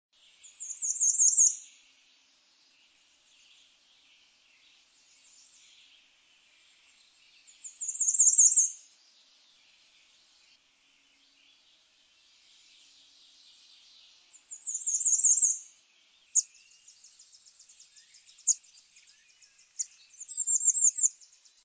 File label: Bay breasted Warbler